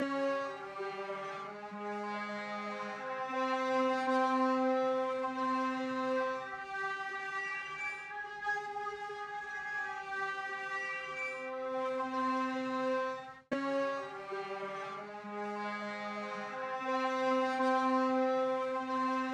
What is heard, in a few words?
142 BPM F Min Strings, Drill; Loop Stems; Cymatics; Bastille